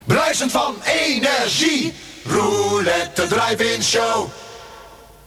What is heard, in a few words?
silence